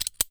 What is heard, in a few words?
click